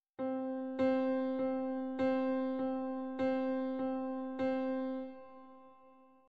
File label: The Accent 4x1